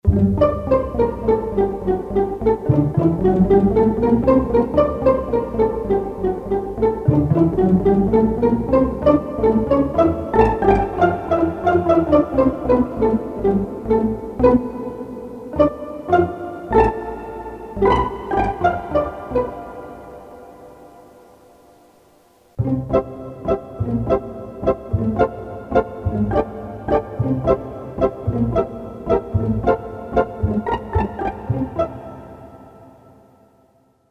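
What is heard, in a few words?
pizzicato violin, Korg DSS-1 strings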